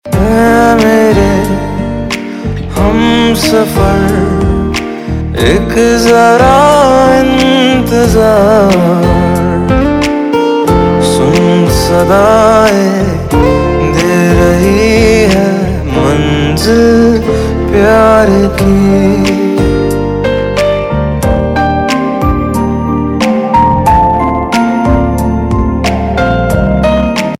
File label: Male Ringtone